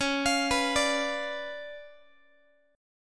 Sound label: C sharp 7th Flat 9th, Guitar; arpeggiated; chord